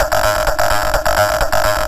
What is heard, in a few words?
VFX2 Loop 128 BPM